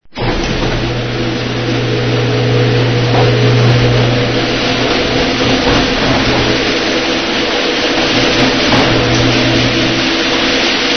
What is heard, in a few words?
GAR DOOR